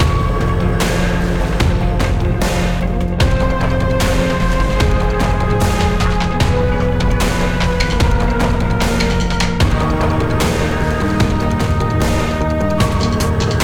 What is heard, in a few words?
boss battle; Gl Rook Bossbattle; Griftlands